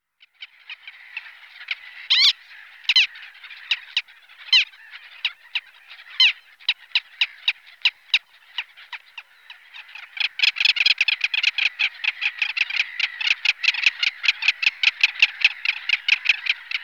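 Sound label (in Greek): Bird nanoglaros, little tern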